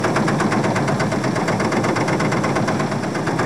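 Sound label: machinery out loop; Garry's Mod; tf2; cp harbor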